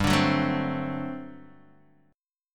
G Major 7th Suspended 2nd Suspended 4th G down Guitar Open D